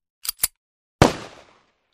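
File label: Colt 45 Pistol Cock Fire; Shoot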